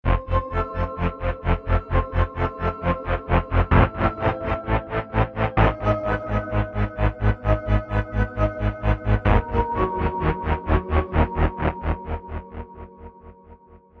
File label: Tape Arpeggiator Gate; Heavyocity Mosaic